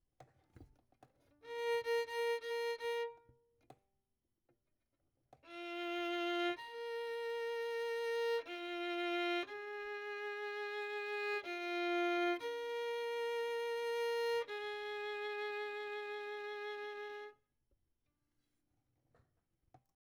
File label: Violin; Verdigris Music